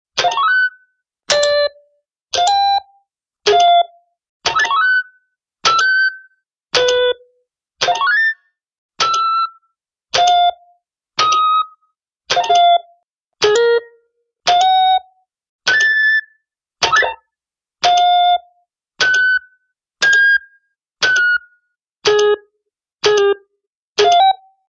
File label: buttons, computer